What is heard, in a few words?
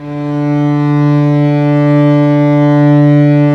STR, Vcs Sordino; STR Vcs Bow FX